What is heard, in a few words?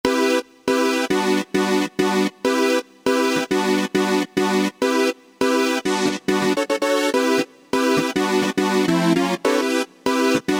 Maj7 Stack; Generalmusic Equinox